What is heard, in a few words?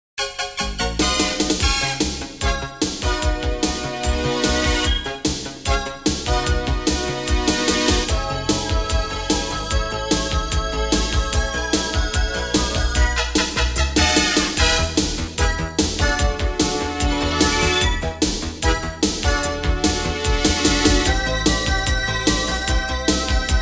music electronic